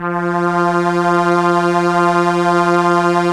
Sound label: Strings Arp SE F4, ARP Ensemble; Keyboards of The 60's and 70's